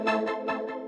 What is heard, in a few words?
ring; openspace3d; oculus nation